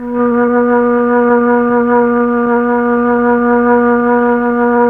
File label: FLT, Melotron Flt, Tron Flutes